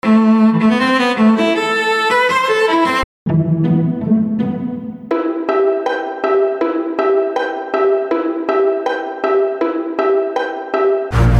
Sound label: strings loops